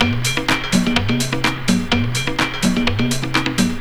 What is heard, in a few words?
TRIBAL LO, Techno Trance; E-MU Formula 4000 Series Vol. 2